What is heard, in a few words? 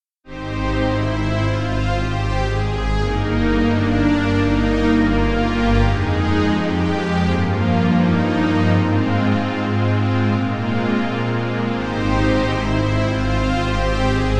Analog Strings; VIVO S10